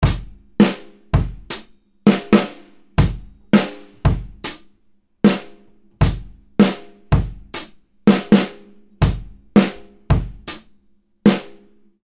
Drum Loop, 80 bpm, drunken groove; drum loop packs

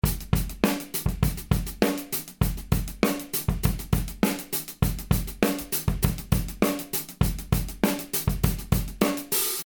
100bpm 16 hats, drum loops; drum loop packs

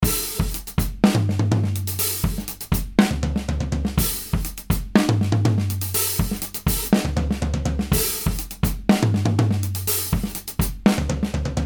122 bpm, drum loop; drum loop packs

